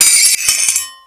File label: SW HIT, MORESWORD; Total Control - Action Cutz